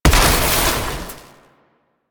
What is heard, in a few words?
Core Electrical Explosion; Warframe; Ambience; Corpus Gas City; Gameplay Remaster